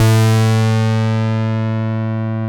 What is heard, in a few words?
TEISCO, BASSES